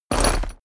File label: horse step saddle